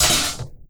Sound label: TRASH, Anime Sound FX; COMIC